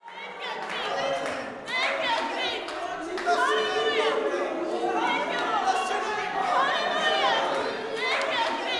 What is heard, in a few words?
WORSHIP, WORSHIPAMB, Vocal Planet, Gospel